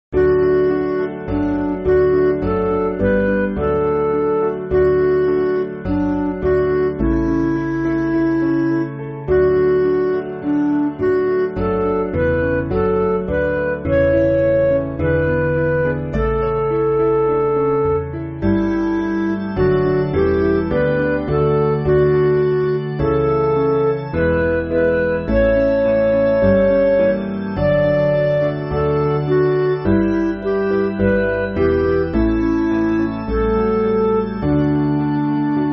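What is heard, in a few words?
Midi, Music Backing Files for Churches; Break Thou The Bread; Sherwin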